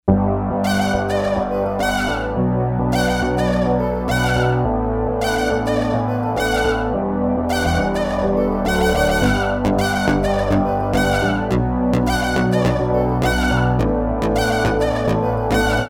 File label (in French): Accord